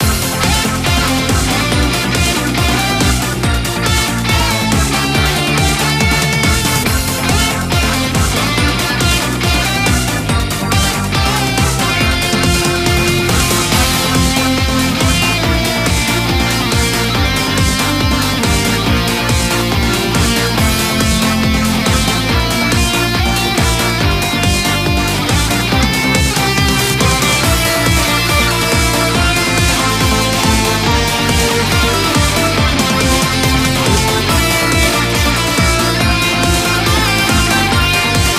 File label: Music Game General event